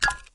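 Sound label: button press; Bunnicula - Juicy Bites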